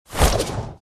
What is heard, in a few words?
Vampire supernatural speed movement